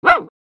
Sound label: Small dog single bark